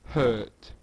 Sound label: hurt